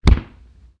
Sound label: fall